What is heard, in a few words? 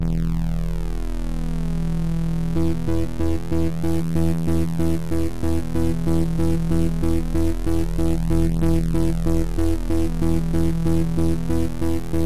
late stage boss; Fronter